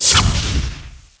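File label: fireball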